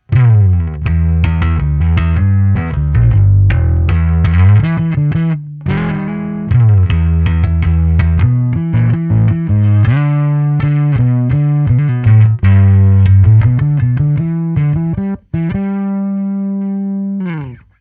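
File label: Preci Overdrive, bass; NXT210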